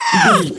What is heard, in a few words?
goblin fairy hurt pain